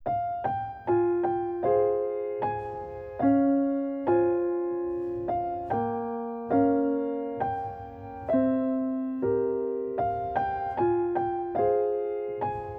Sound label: Cobblestone Roads (Instrumental)